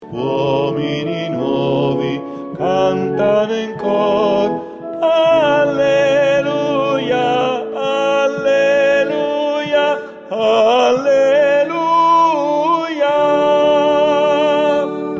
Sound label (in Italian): Alleluia Ten Voc; Visita Arcivescovo